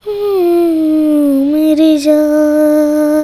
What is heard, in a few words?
CHILD, Indian Traditions; USB Soundscan vol.45; AKAI